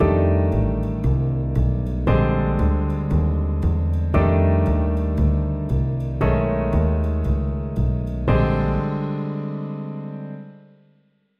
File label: blues piano; blues piano tricks